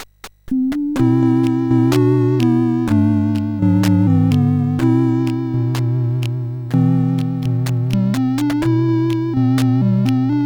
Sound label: Boardwalk, CSG8580R5